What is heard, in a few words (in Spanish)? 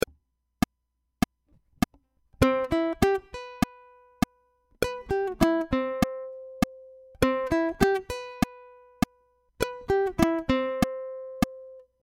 C Escala Back Pause Click